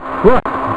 TRUCK; M4 7.5.2; M4™ (PowerMac)

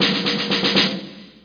Snare Phrase; SpecOps2MPlayerDemo; Music

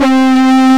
Distortion Guitar; GMTones

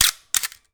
Camera Shutter B 35mm SLR; quickcam showcase; pmdemo

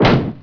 collision med; SpecOps2 MPlayer Demo

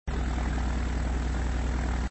C47; red cross eru

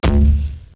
poof

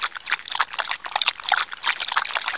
Water Sheep Drinking

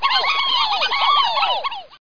yippee; DR BRAIN; BUGS

